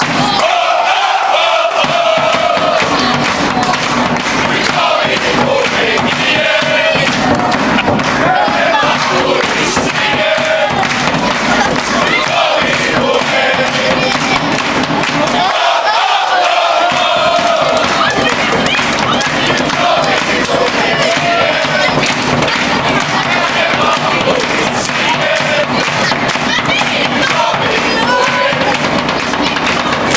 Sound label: fanchants